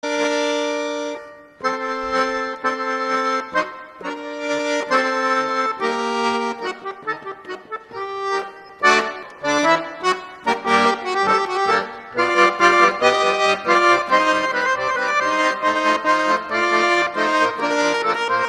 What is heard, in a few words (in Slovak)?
akordeon